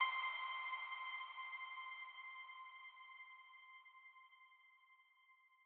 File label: soft key; thetascape; alpha